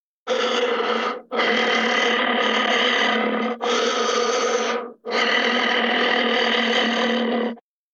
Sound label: Monster Breathing